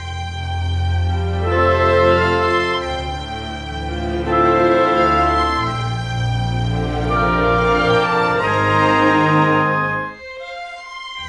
Rock Pop, Orchestral; Sonic Foundry (Sony Creative Software); Orchestral Series VOL-4; Rock and Pop

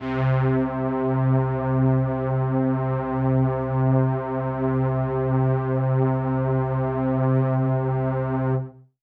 Pad C, Synth Prophet One Shots; Pads; Electronisounds

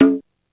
CONGA M1, DRUMS; East West; Global Sounds

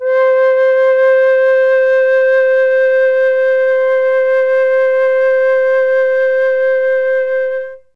flute C4, Classic Orchestra; Best Service ProSamples vol.51

